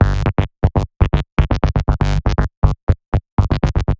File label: Tech Bass 120 G, techno; musicradar; 120bpm; Bassline